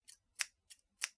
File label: office scissors cut air slow x2; sound effects one